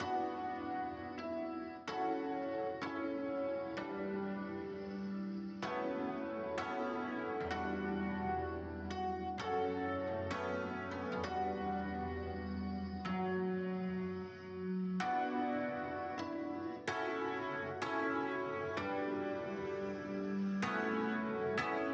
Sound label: piano, 128BPM; Pony Fan Music Remix Stems